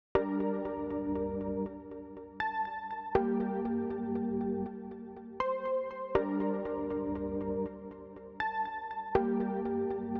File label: Pluck